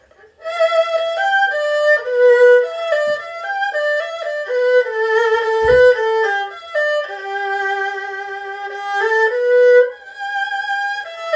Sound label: purple bamboo melody